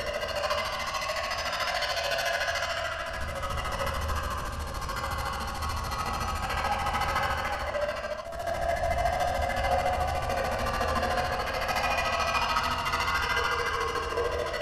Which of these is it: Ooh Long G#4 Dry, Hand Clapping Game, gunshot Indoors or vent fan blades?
vent fan blades